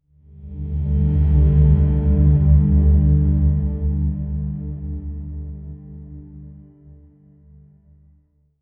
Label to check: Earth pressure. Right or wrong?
Wrong